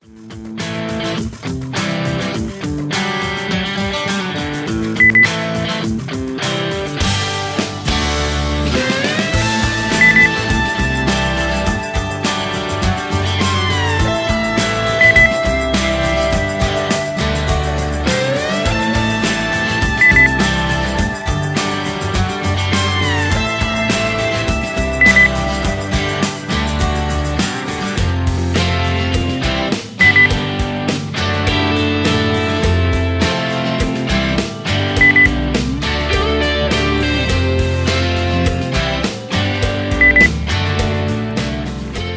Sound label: rock